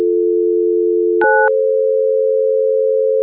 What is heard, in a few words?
outside line